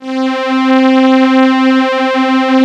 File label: PAD C4, STRING; Q Up Arts; Dream Experience